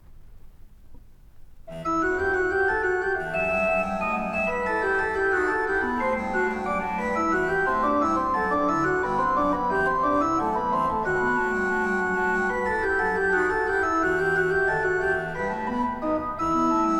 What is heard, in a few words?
Chorale; Schübler no. 2; Wo soll ich fliehen hin; BWV 646; Johann Sebastian Ba